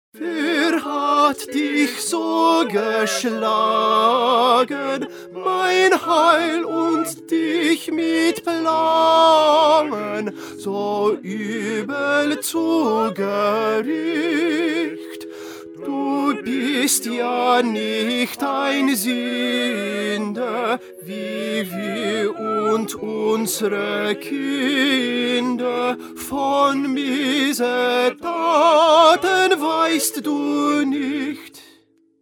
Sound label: Tenor; Bach; St John Passion